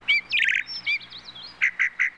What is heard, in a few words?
thrush, ambients